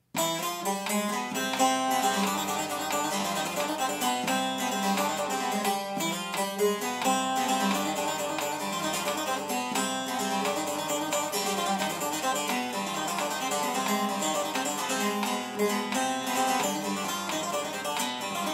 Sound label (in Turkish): azeri oyun havasi